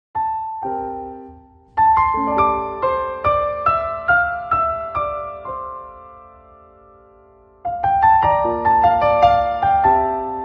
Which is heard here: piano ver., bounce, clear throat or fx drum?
piano ver.